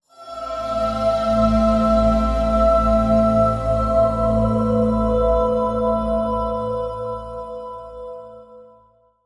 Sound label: Equinox Drone, Link Sting